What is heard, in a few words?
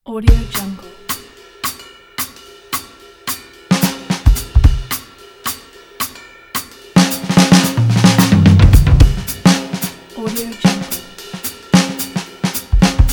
jazz drums